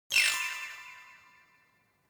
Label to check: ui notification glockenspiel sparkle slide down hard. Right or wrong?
Right